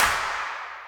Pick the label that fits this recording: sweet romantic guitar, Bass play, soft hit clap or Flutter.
soft hit clap